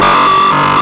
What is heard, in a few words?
fast draw